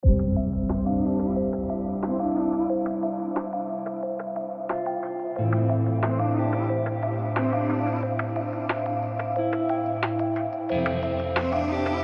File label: music